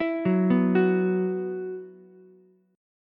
B Suspended 4th, Ukulele; 9,6,7,9; arpeggiated; JGuitar

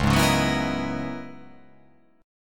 Db Minor 13th, chord; Dbm13 strummed; JGuitar

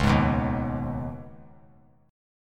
C sharp 7th Suspended 2nd C sharp down; C#7sus2; strummed; JGuitar

